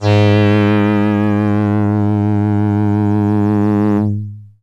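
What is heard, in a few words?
Box Cello DX100 G sharp 6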